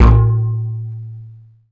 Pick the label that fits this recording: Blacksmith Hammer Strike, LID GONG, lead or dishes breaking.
LID GONG